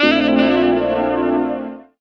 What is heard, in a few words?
saxist, stabs